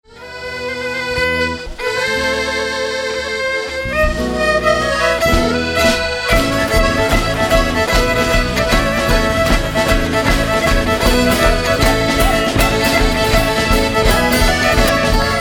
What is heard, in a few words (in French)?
Galop; danses folks